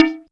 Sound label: COWBELL; RAW PERC; Zero-G; Funky Elements